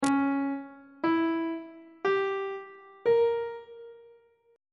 d flat diminished 7th chord intervals on treble clef